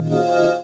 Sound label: Chords Tones; Equipped Music; Loungin House